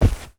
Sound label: Walking Fast Low